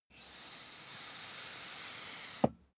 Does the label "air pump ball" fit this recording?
Yes